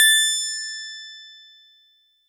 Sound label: Harpsi FM DX100 A6